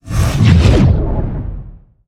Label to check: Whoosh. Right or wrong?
Right